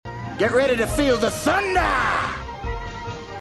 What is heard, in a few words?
asian kung fu